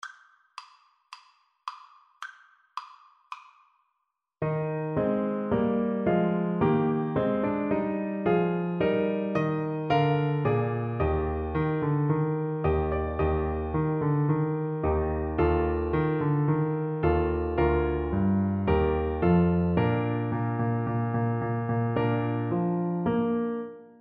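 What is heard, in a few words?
bach march in d, alto saxophone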